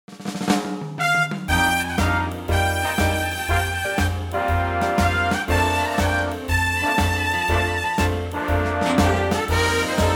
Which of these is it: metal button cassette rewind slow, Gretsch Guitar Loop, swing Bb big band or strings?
swing Bb big band